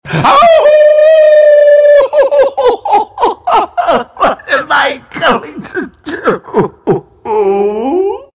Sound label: howl